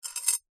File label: household jewelry necklace metal pick up from glass; zapsplat